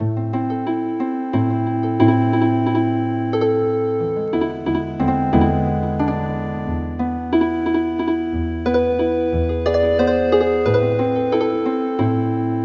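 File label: Healing Harp; traditional; Wishing you a Merry Christmas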